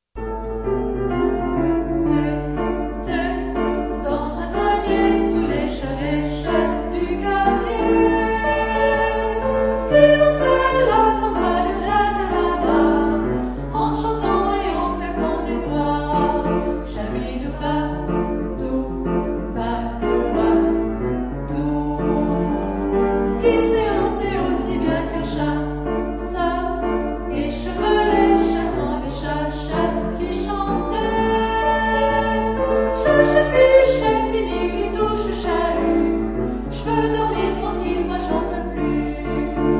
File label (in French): chats chant